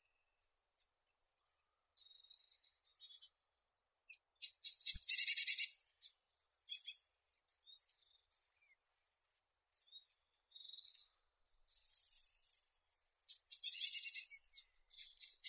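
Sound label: blue tit, Cyanistes caeruleus; lazorevka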